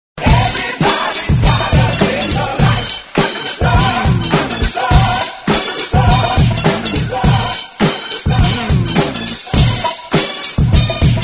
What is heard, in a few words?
funk; multitrax